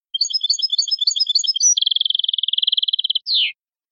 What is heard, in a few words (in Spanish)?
gorrion; campero